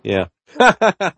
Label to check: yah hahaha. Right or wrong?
Right